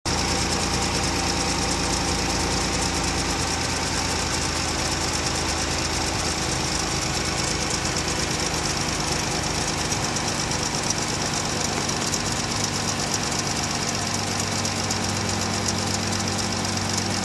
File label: ticking and squeaking noise; dodge caravan